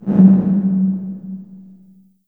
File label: LH LC808, REVRB LARGE HALL